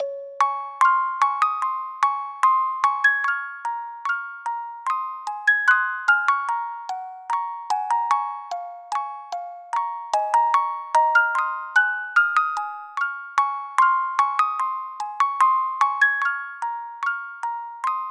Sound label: Ballad, Music Box; animal crossing new leaf welcome aamiibo soundtrack